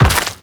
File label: Step Dirt, Footsteps; Warframe; The New War; Characters; Kahl